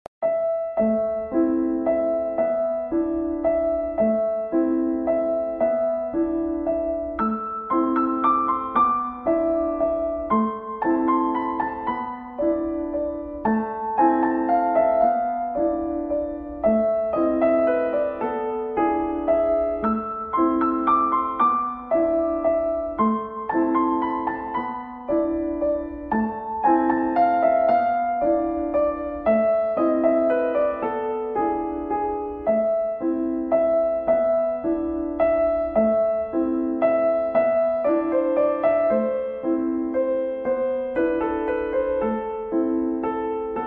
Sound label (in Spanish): tristes de fondo